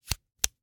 Hwatu, card game, hard plastic, small, clatter, pull, slap, throw down, single card, strong; Eiravaein Works; Vaeyan IV